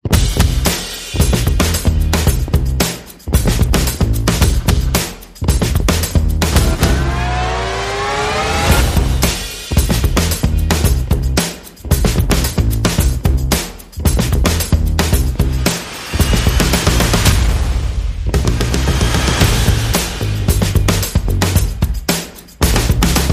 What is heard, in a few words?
Percussion Bass and Effects